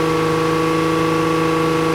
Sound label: Mazda MX3 Loop, Automobiles